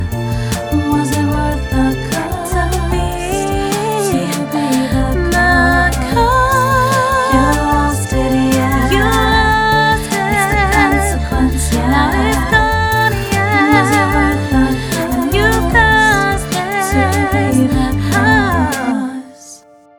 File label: outro w adlibs